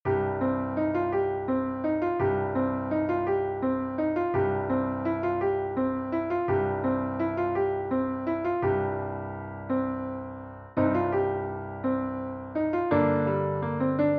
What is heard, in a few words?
easy piano